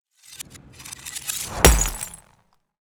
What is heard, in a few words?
whoosh impact; Bluezone Corporation; Cinematic Trailer Sound Effects